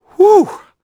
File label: sigh; Dialogue; ENG; Cars; XBOX